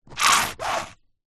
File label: household shampoo bottle squeeze little out squelch